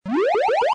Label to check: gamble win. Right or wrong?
Right